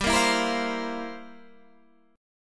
F Major 7th Suspended 2nd Suspended 4th, G, guitar